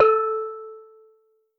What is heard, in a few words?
bell note; HeavenStudioPlus; launchParty